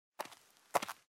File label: Footsteps; Age of Empires III; cinematics